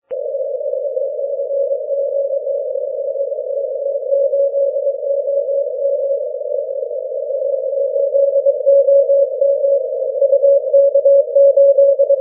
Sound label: 6m cw